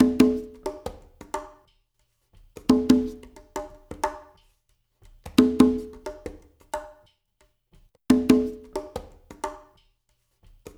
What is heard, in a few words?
SWT CONGAS, SWINGTOMS; Spectrasonics; Liquid Grooves 1 & 2